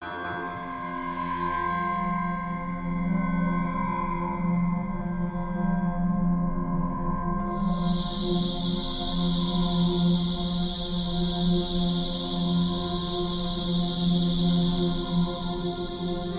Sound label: Daylight Drone